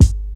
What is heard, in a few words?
old school loud hip hop kick sample g key